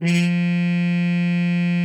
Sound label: HARM F3, TR MUTE; Xsample VOL-3